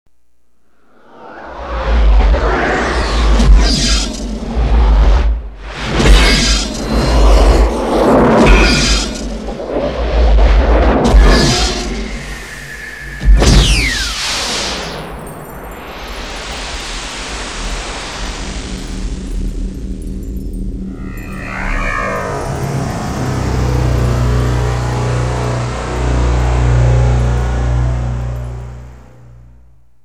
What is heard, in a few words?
THX Dolby Digital Theater Surround Sound Test